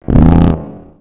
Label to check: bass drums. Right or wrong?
Wrong